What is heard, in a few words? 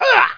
npc attack; specops